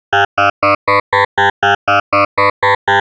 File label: shepard spiral down